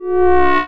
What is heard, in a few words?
Science Fiction Sci-Fi Electronic Monitor Starting